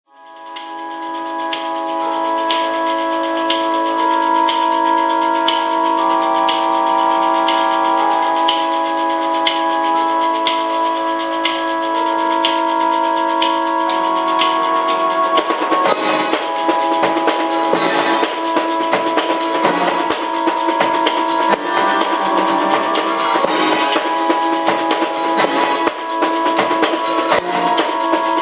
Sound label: Techno live